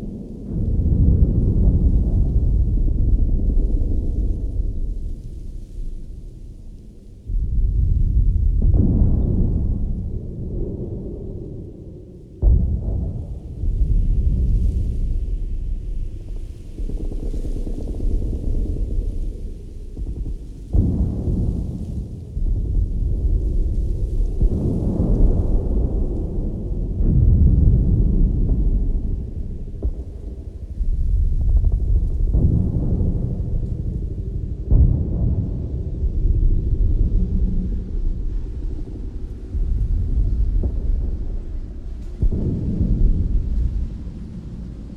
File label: City Streets Ambience; Death Or Glory